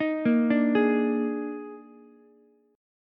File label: D sharp Major 7th Suspended 4th, D sharp, down, Ukulele; arpeggiated; D#M7sus4; JGuitar